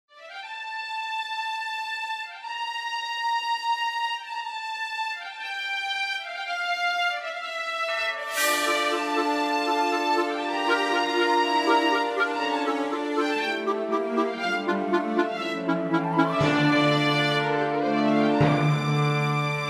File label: Orchestral med tempo mystery